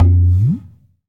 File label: Dance Drums; Best Service ProSamples vol.15; AKAI